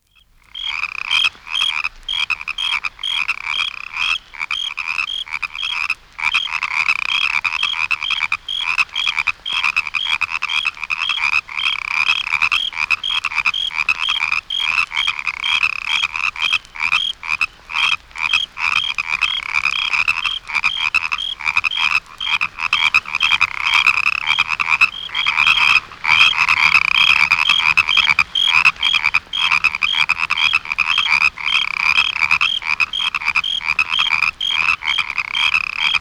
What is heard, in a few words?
Frogs In the Swamp; Dr. Sound Effects; 210 Nature Sounds - 20 Hours of Relaxing Natural Ambiences for Meditation and Sleep